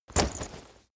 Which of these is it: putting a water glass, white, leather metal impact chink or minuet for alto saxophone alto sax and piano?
leather metal impact chink